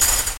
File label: skill chain end